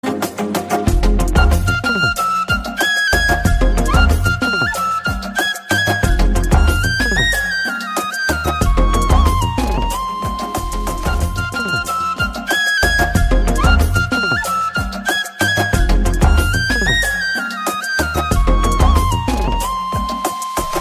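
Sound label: background music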